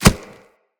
Kubrow Step Dirt, Footsteps

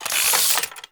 Sniper Zoom In; Warframe; Grineer; Kahl

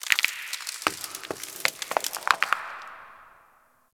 Ocean Rock Crack Small; Warframe; Grineer Ocean

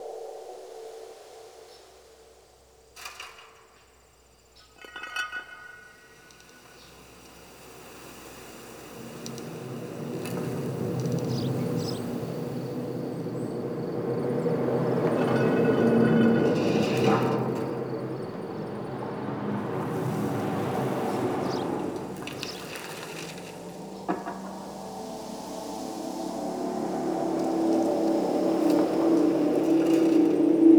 Fall Exterior Medium Bed Loop, Ambience; Warframe; Nineteen Ninety Nine

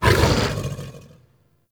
Axe Weapon Idle; Wolf Frame

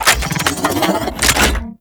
Arch Gun Reload; Weapons; Warframe; Archwing; Hildryn

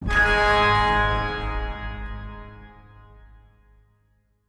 Organ Chord Note G Sharp; Polluter Organ

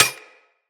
Bullet Impact Generic Metal; Weapons; Surface

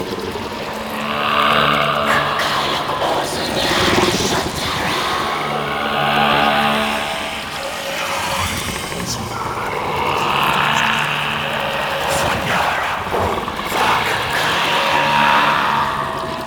Lore Fragment Loop; Warframe; Duviri Murmur

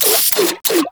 Bubblegun Pistol Reload

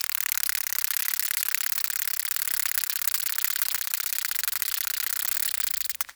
Forest Insect Rattle Long Specific, Ambience; Warframe; Grineer

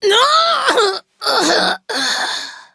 Death; Warframe; Sprag; Battle Barks; en

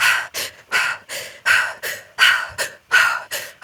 Breath Heavy Fast Adult; New War; Adult Operator

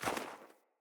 Sandals Walk Snow; Player; Footsteps

